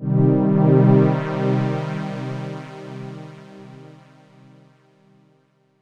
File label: Cm, Chords; M8; Electronisounds